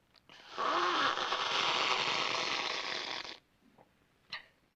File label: Child Soup Slurp; Vintage Cartoon; Comedy Vocals